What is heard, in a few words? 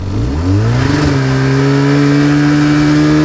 snowmobile rampup; veh snowmobile b rampup